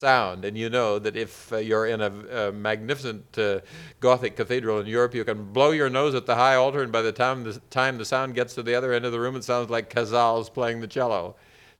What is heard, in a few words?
organ